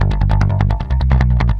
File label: Muffle, Industrial, Nasty